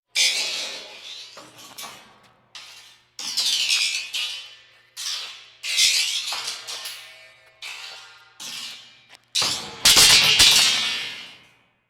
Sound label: crash spinning steel